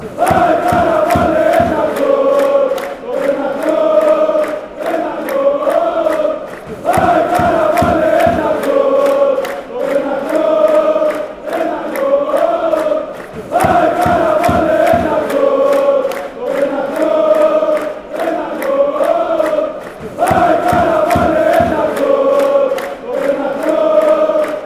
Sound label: aek athens chant, fanchants